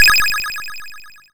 FX Phone; SFX; YM; CHIPSHOP DELUXE; CHIPSHOP HITS

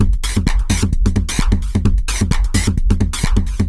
Beat (130BPM), techno; techno drum fx

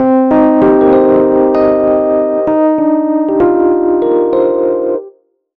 Keys Line 97 C, 97bpm, hiphop; musicradar

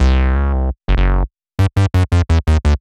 drumnbass 170bpm Bass C; musicradar; Basslines A

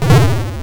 YM Shiver; SFX; CHIPSHOP DELUXE; CHIPSHOP HITS

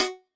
Tech Drum Hit, techno 120bpm

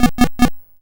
YM Synth B; SYNTHS; CHIPSHOP DELUXE; CHIPSHOP HITS

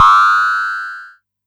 Amdek Perc; techno drum fx; Analogue DrumHits

